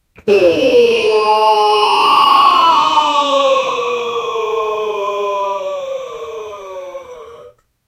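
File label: superhero landing in ground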